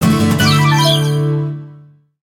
Guitar Attack; Vivid Knight; switch windows gamerip 2021

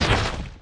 Roots

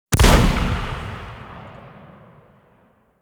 Gun Shot

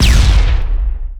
Grenade Explode

